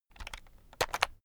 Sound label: keyboard external shortcut long